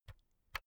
pull out usb b; objekte; elektronik

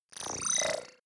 bubble fizz appear, swooshes swishes

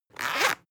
zipper open fast; objekte; reissverschluss

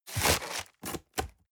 cardboard box open unfold; objekte

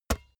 basketball single hand catch soft

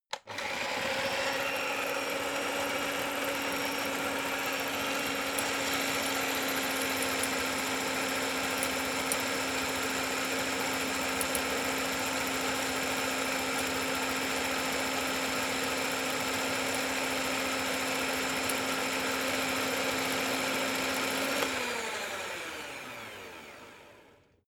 electric whisk spin rear long; haus-garten; kueche

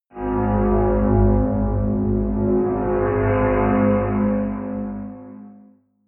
roaring drone

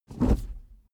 suv pull back gear across fast; verkehr transport; autos

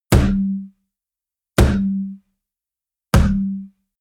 can heavy hit distorted; whooshes rises; hits impacts

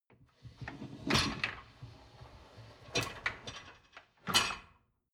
dishwasher lower tray draw out long; maschinen; spuelmaschine

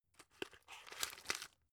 drug pull out; arzt

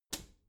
bathroom cabinet door close soft

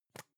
plastic can lid screw open soft; objekte; dose

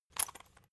salt mill metallic; gastronomie; soundpool

